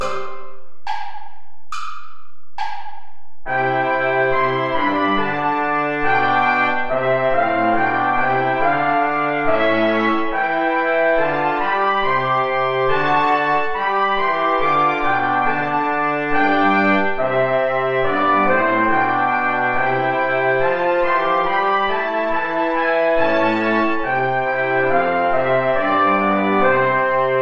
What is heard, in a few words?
play along without trombone